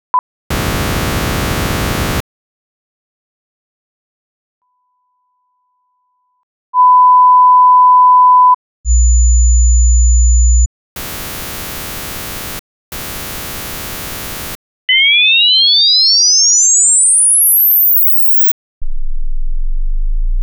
Test signal silence intro; rmaa

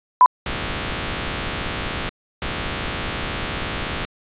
Test signal (44 kHz 24-bit) Stereo crosstalk

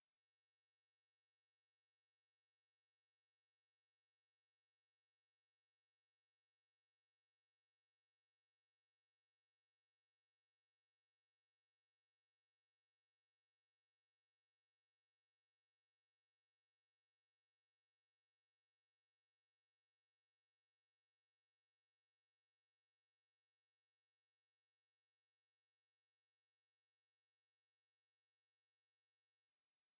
IMD 19kHz 20kHz; 16b; CCIF

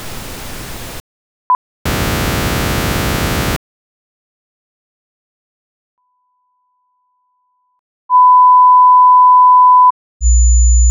Test signal noise header; 48 kHz 24-bit; up96k

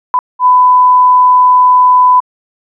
Test signal THD; 44 kHz 24-bit

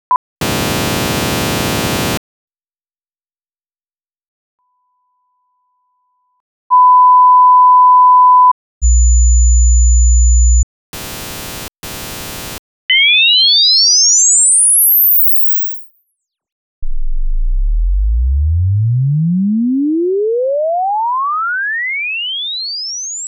Test signal; 96 kHz 16-bit

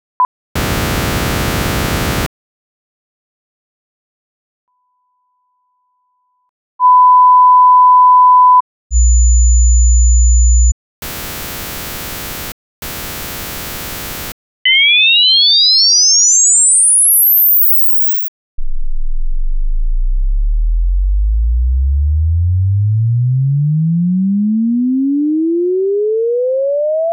Test signal (48 kHz 24-bit)

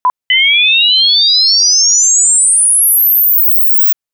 Test signal IMD+NOISE swept frequency; 44 kHz 24-bit

